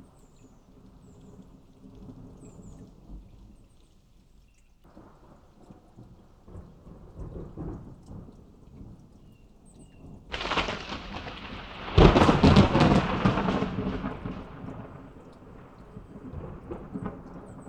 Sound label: long thunderstorm